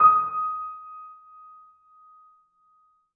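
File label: piano forte d#6, Grand Piano; Sonatina Symphonic Orchestra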